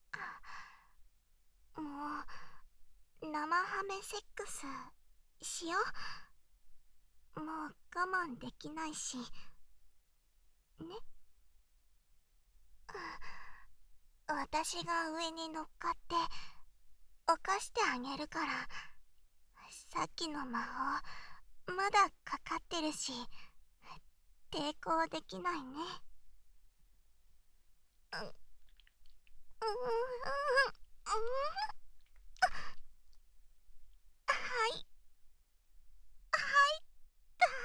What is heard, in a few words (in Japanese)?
指騎乗位耳舐め, ASMR; シエラ